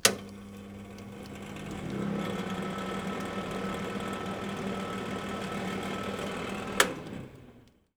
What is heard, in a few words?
Welder Power Supply, Workshops